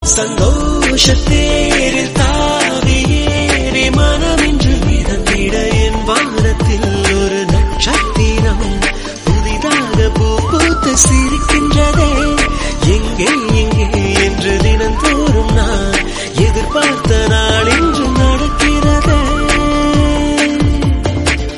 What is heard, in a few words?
Tamil Song Ringtone; Kireedam